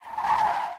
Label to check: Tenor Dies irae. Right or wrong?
Wrong